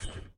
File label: Climb Ladder Loosen Grip